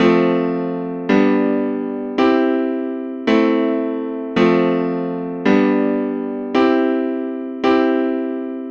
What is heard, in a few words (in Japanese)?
Ⅳ→Ⅴ→Ⅰ→Ⅵm→Ⅳ→Ⅴ→Ⅰ進行